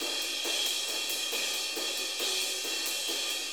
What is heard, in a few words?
4-4 Ride Loop